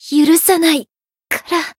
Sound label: retire, jp